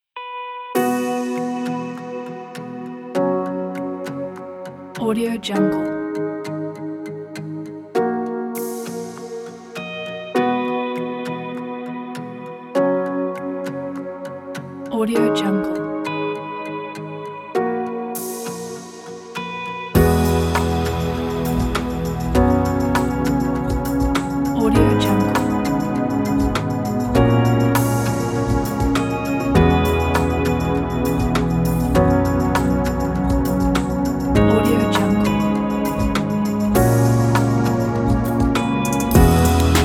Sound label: beautiful soft ambiance